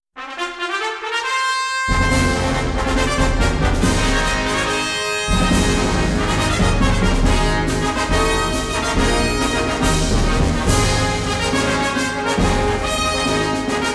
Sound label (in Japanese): ファンファーレ; 盛岡レース場 JpnI競走; umamusume pretty derby winning live 12 2023